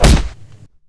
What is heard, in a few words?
melee hit